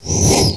Piercing

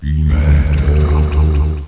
Gorgon

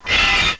Blood Attack

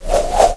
Knight Skill

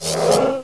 Shadow Attack

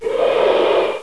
Yeti Die

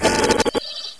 Hell Spider Die

